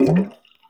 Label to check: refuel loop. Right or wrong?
Right